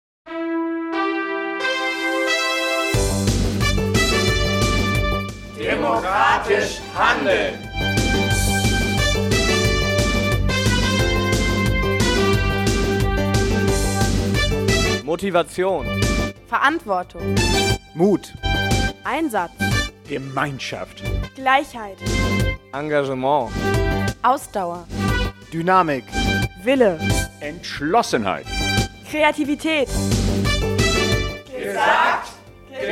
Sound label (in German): Jingle